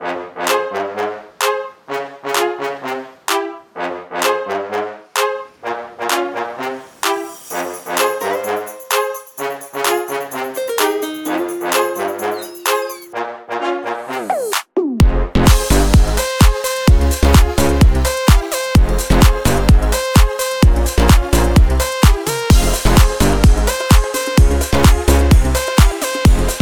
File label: Groovy Brass (Instrumental)